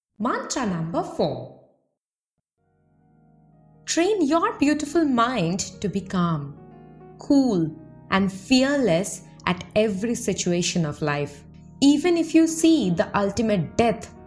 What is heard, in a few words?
Mantra